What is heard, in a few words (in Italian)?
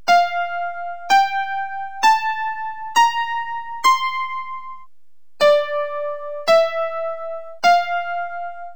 SCALA IN MI